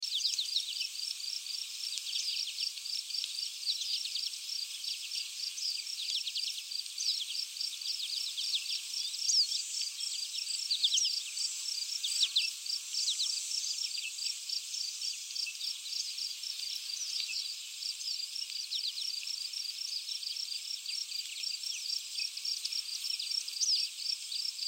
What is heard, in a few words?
Marsh Bugs Birds Loop; Environmental; Ambience; Blastwave